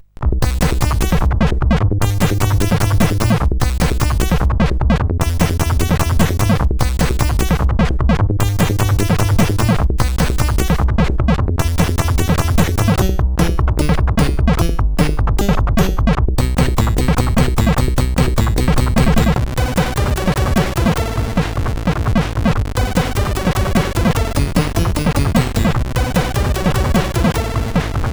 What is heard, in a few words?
Rain sid CSG8580